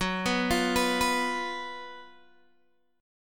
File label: Gb Major 7th Suspended 4th, down, Guitar, Open D